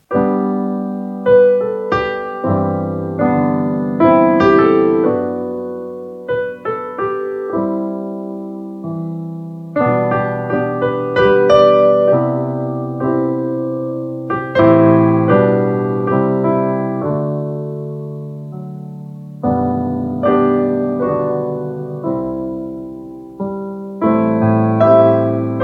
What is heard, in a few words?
closing music